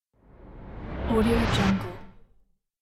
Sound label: cinematic sound design